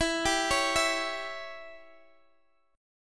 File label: Db Diminished E, chord